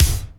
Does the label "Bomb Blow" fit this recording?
No